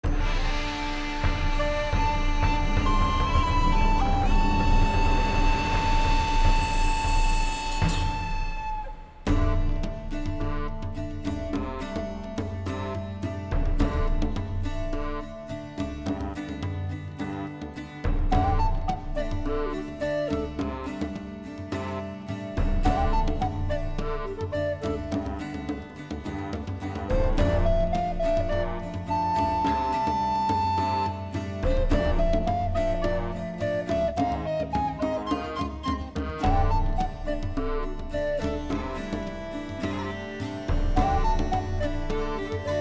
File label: Outdoor; PAPER MARIO Color Splash; wiiu